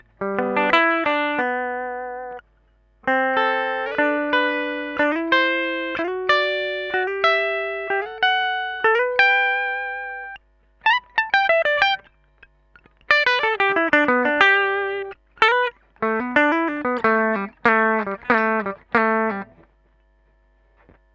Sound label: Neck, guitar